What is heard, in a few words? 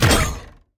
tower twlight Longbows basic Attack cast no Charge